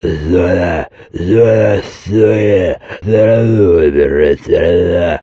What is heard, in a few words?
zombie idle; STALKER; customnpcs